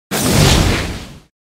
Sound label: fire; shoto todoroki